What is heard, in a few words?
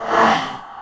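damage, Monster; 흑수사; 법왕원소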